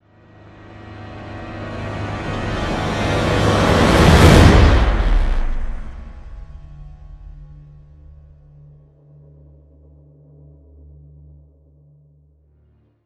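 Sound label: sting, swell; transformers war for cybertron gamerip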